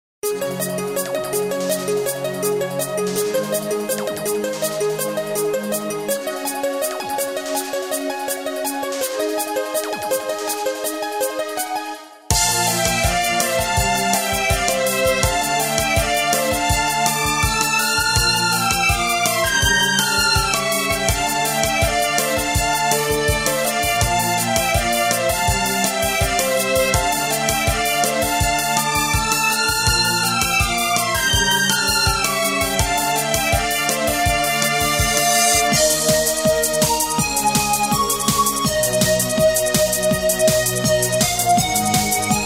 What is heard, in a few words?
romantic